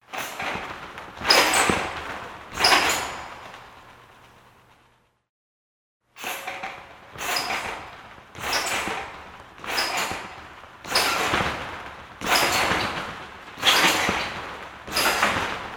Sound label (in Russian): gimnastika uprazhnenie na batute